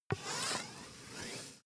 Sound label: air pump ball pull